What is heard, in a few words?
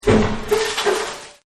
Pull It Out, Crunch; Crunches; Objects